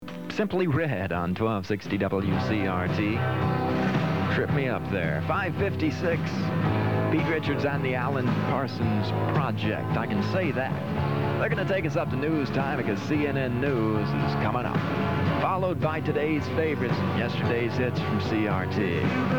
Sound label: jingle; wcrt